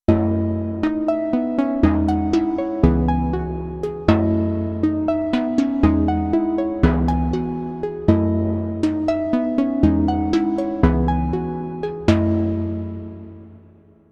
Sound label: Korg minilogue xd